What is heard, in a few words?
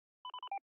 radio stop talking